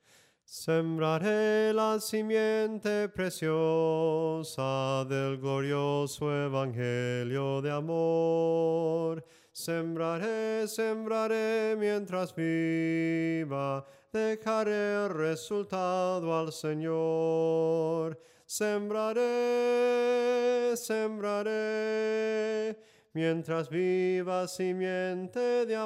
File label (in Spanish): SOPRANO CANTADO, himnos en melodia soprano